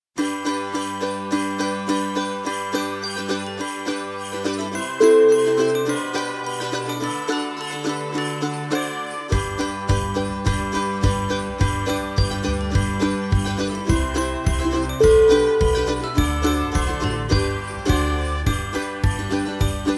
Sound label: jingle bells christmas